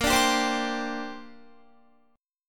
Bb 6th Add 9th Bb Guitar; Bb6add9 strummed

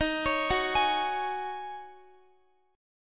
D sharp Minor 11th D sharp Guitar, chord; D#m11 arpeggiated

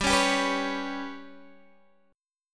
G Major Flat 5th, Guitar, Standard; GMb5 strummed; JGuitar

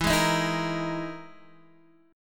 E Major 7th Flat 5th E down Guitar DADGAD

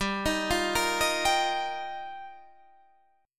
G Minor 7th Sharp 5th, Guitar, Standard; 15,18,15,15,16,15; Gm7#5 arpeggiated; capo=2

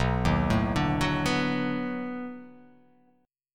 B 7th Sharp 9th B down

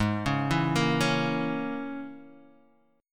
A sharp Minor 7th Flat 5th G sharp

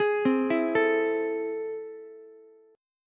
A Minor Major 7th, down, Ukulele; arpeggiated; AmM7; JGuitar

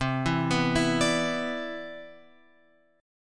C Major 9th, C, chord; CM9 Chord {8 7 9 9 X 10} arpeggiated

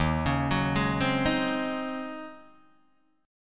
Eb Minor 13th Eb down Guitar DADGAD; Ebm13 arpeggiated; JGuitar

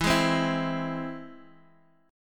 E Augmented; E+ strummed; JGuitar

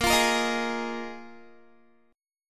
Bb 7th Suspended 4th Bb Guitar 4E,4A,5D,5G,5C,6F

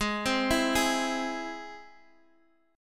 G sharp Augmented G sharp Guitar Half Step Down

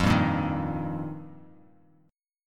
F 7th Suspended 2nd Suspended 4th, Bass Standard; F7sus2sus4; strummed; JGuitar

